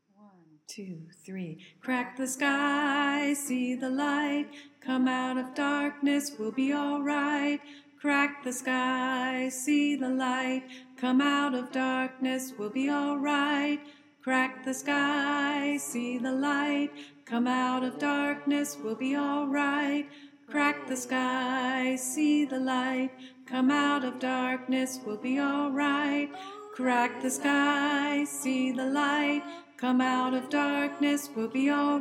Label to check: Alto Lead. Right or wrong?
Right